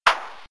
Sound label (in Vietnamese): Âm thanh vỗ tay 1 tiếng duy nhất